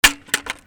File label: FALLING